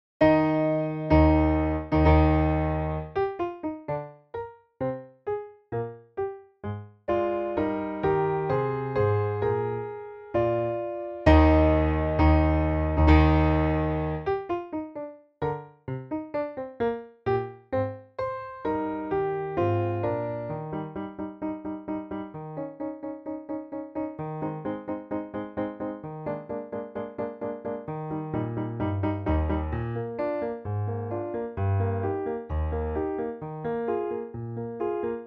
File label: Piano Accomp Allegro; Trumpet Concerto in E-flat Major